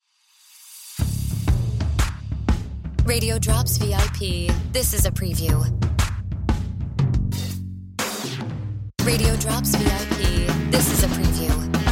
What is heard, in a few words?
Music Beds